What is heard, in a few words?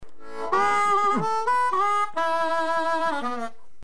Tone Good, harmonica